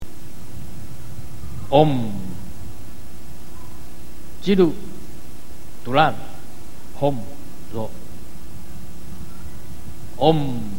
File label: ucchusma short mantra